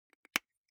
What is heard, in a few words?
object office marker open lid; smartsoundfx